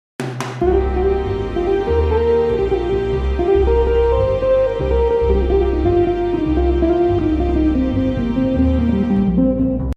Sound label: swell with riff